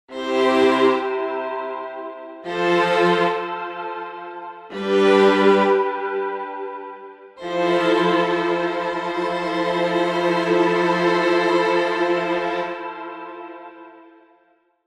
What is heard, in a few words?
Ensemble Strings Viola small Dynamic Expression Long Breathing; Chris Hein